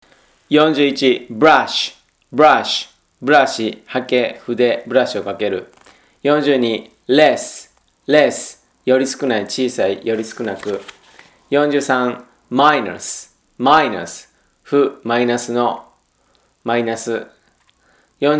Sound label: tango